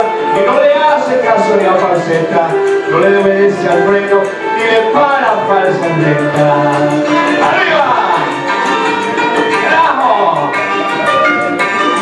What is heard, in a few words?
sings karaoke at friends tavern